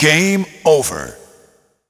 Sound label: Game Over With SFX; Gungage; PS1